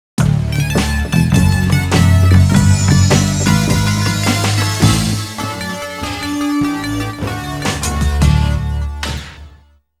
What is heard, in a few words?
Industrial Zone Completion; frogger he s back